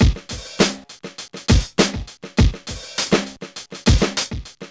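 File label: 101 bpm, Funky loops; Zero-G; Funky Elements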